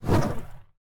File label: Burning Hands Whoosh; Evolve; Meteor Goliath